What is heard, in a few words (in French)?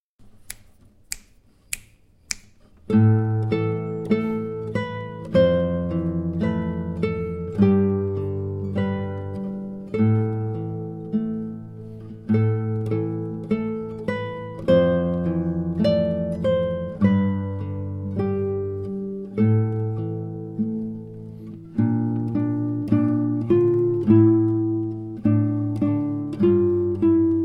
melodie celte